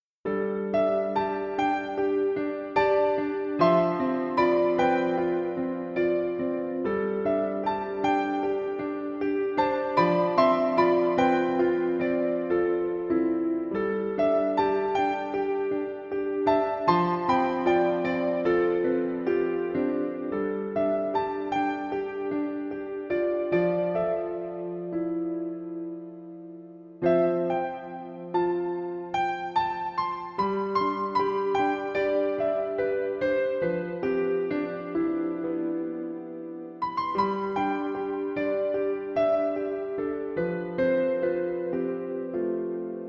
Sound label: A Light with a name of HOPE (piano arrangement); Zone of the Enders; Z.O.E. Game OST